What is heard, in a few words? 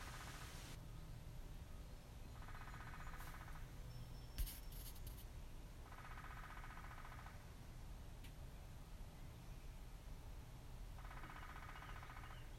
Woodpecker